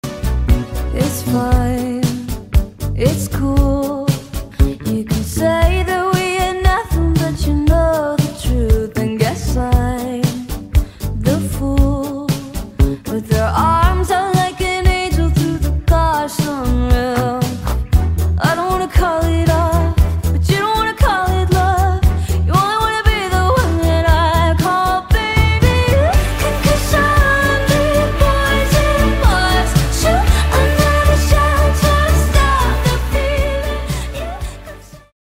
Ringtone

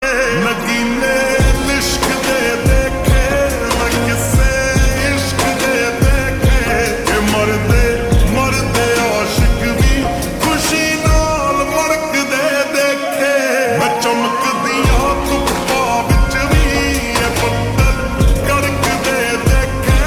Ringtone Slowed Reverb

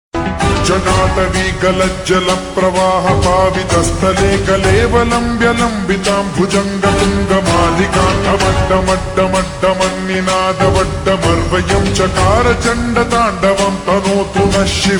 Ringtone Slowed Reverb

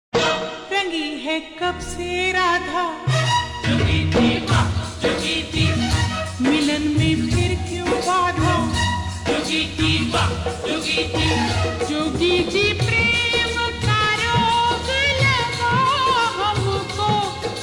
Slowed Reverb; Nadiya Ke Paar

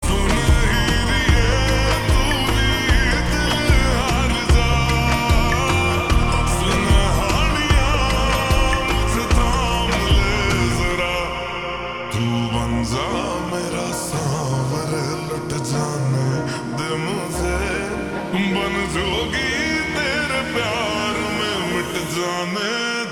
Ringtone Slowed Reverb

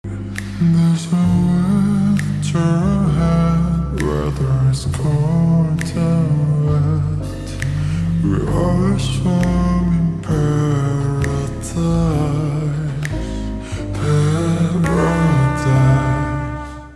Ringtone Slowed Reverb

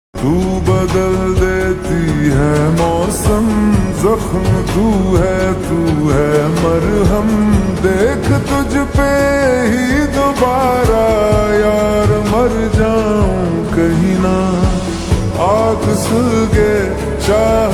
Slowed Reverb

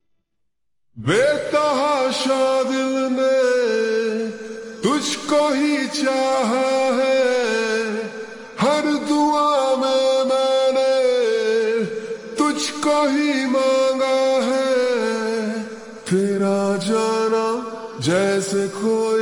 Slowed Reverb

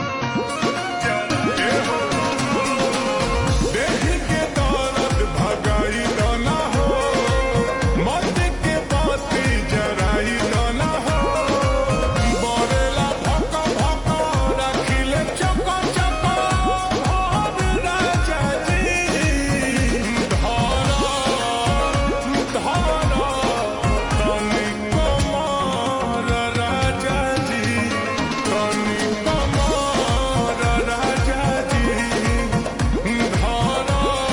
Ringtone Slowed Reverb

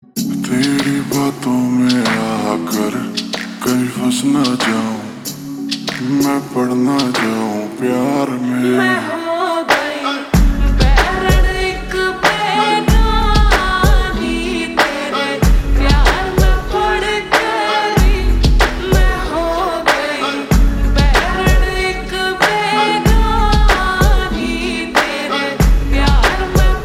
Ringtone Slowed Reverb

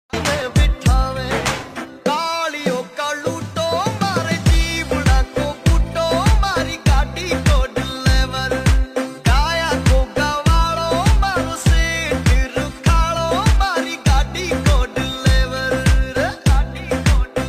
Ringtone; rajasthani

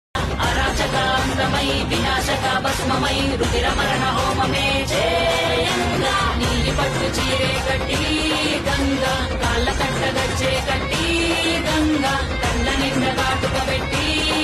Ringtone; telugu

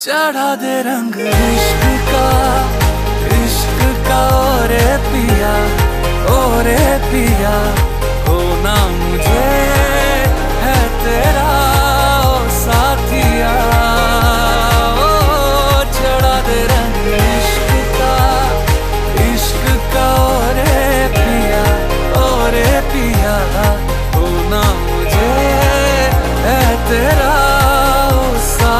Ringtone

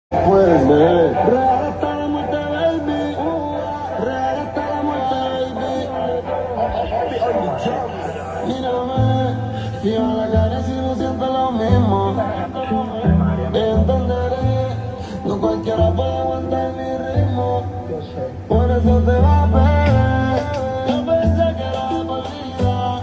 Remix Ringtone

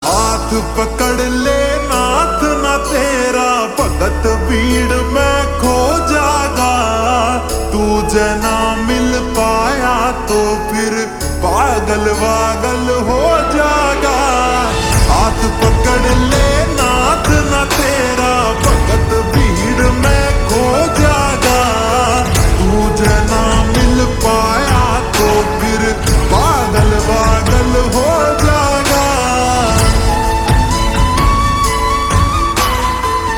Ringtone Slowed Reverb

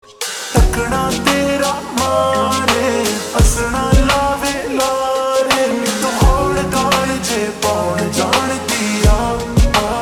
Slowed Reverb

